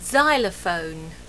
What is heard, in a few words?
XYLO